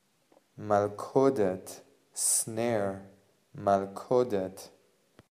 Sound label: Snare